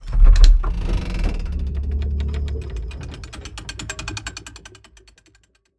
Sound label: seesaw start; fallguys